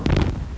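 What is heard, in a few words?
explosion medium; America Invades! Demo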